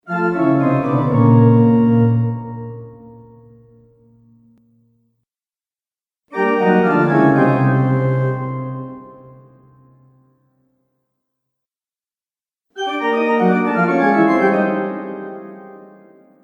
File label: Haunted Organ Failure Sound Collection; Cartoon Music Sound Effects